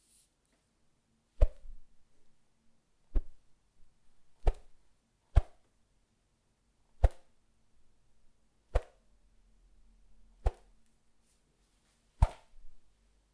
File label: Whip Variations